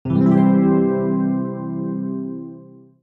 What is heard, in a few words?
arpeggio